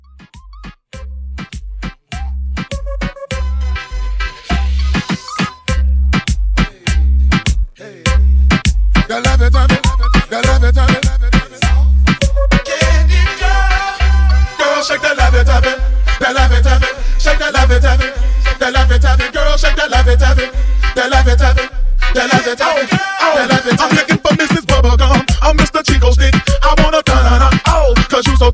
Reggaeton

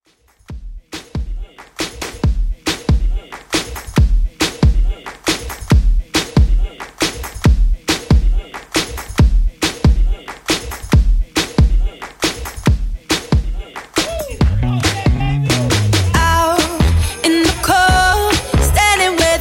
Christmas In Outro 138Bpm

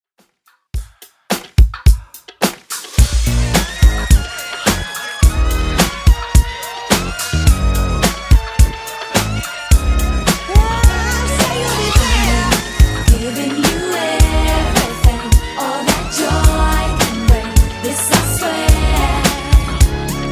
90s Redrum 107 bpm

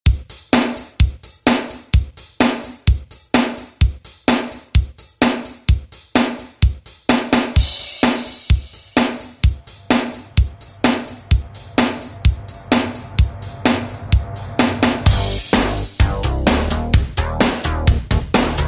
80s Re Drum

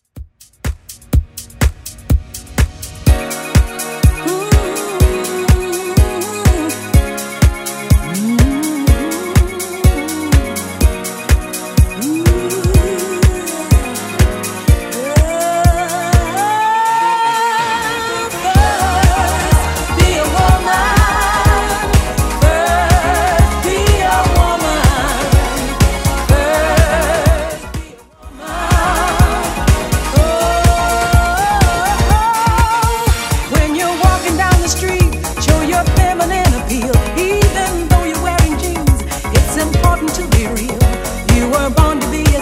80s Redrum, 124 bpm

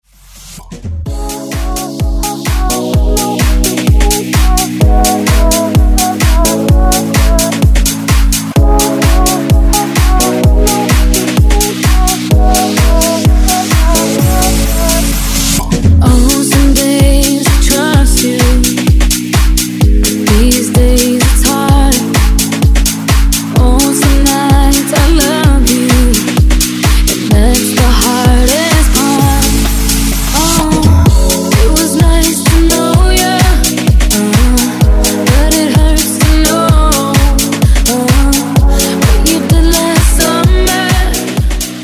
house redrum

